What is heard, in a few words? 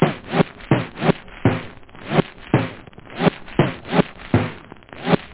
scratch; da capo vol1